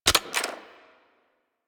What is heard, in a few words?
Arch Gun Alt Mech; Warframe; Archwing; Hildryn